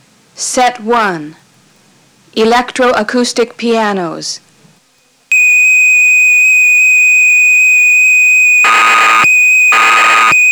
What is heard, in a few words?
Electro Acoustic Pianos; dx9